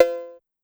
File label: Cowbell 808, Clavia DDrum AT, Pitched Down